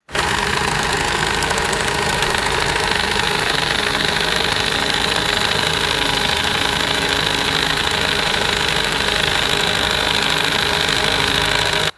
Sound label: portable generator running constantly